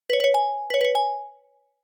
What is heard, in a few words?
Alert-Hurryup; Sharp FX Plus